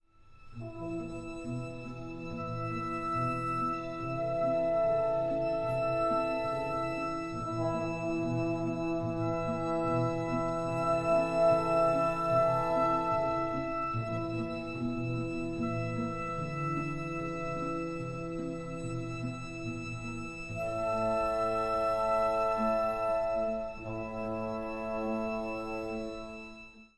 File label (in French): pas des chevaux et chameaux